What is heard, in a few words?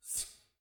Simple Slash Attack Sound